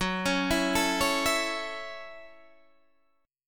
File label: F sharp Minor 7th F sharp, Guitar DADGAD